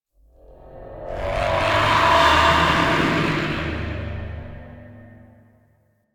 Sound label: Sky Screech; Exterior; Ambience; Duviri; Warframe